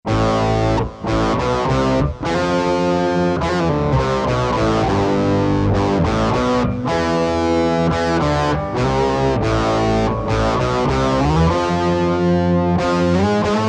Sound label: OCTA SUB; Digitech RP200; Factory Presets